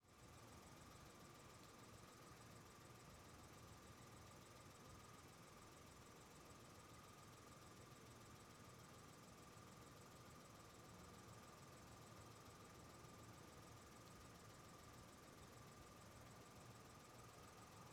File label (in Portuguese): Carro ligado parado em rua não movimentada e alguns ruidos de noite; maquinas; colecao sonora do cerrado